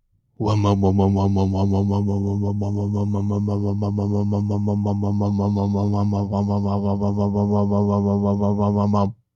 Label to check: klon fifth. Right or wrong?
Wrong